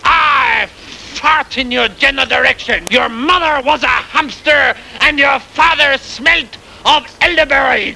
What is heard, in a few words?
fart